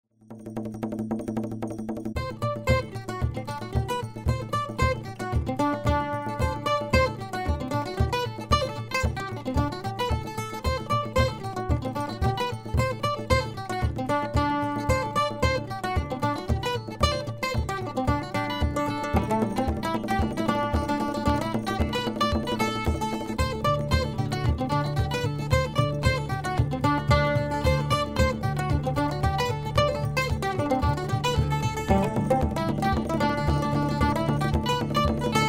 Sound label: Tavern Rock; dungeons amp dragons online